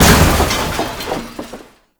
Throne Debris Impact; Roathe; Warframe; Tau

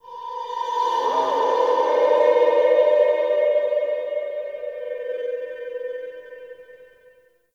Zariman Mid Texture, Ambience; Warframe; The New War

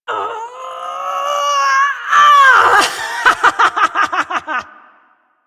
Lift Laugh, Corpus Lich; Warframe; Persona B

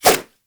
Nunchuck Whoosh; Weapons; Warframe; Infested

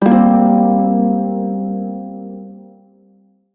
blues chord, shawzin; Warframe

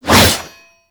Void Heavy Scythe Whoosh; Warframe; Duviri; Incarnon Adapters; Melee

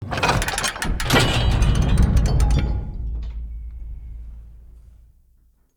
Siege Lever Pull; Midrath

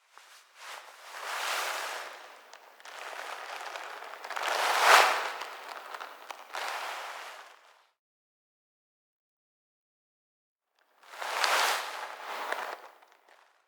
Cloth, Foley; Lotus And Ballas; Warframe; Cinematics; Apostasy

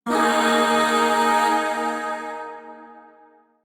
Shawzin Yo Chord H; Warframe; Zariman; Void Shawzin; Chords

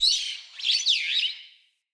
Ext Close Bird; Midrath Island

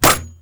Rolling Drone Landing; Grineer; Warframe

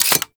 Uzi Pistol Mech; Warframe; Grineer

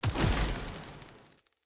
Casting Device Blast, Weapons Tech; Enemy

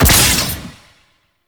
Crack Impact Slash; Warframe; Warframes; Khora

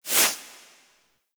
Undead Owl Close Flap; Dante; Warframe

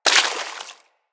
Bullet Impact Debris Water; Weapons

